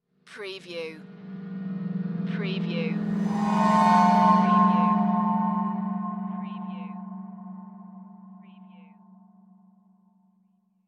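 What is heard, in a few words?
Production Element Swell Eerie; Sound Effects Bible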